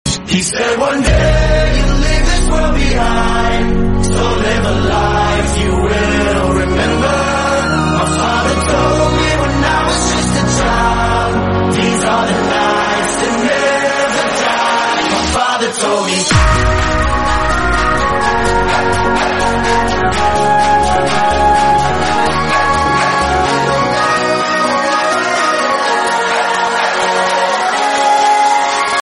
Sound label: english song ringtone